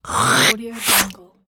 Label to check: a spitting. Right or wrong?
Right